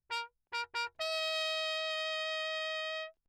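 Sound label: trumpet mute signal; Lechner; Engemann; Perinétventiltrompete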